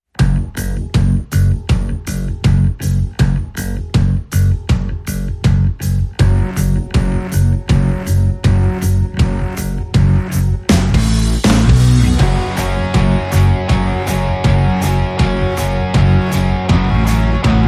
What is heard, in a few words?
No Solos